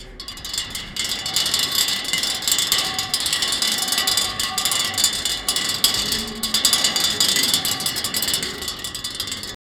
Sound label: sound of a metal vault, safe